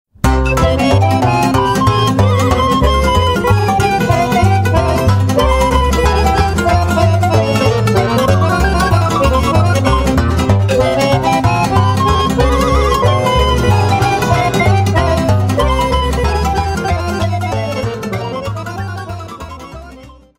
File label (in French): Sirba - Roumanie; Sirba lui ursuleti